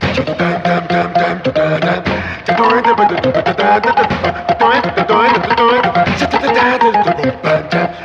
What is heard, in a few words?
keys and voice scatted organ; Loops; Phonky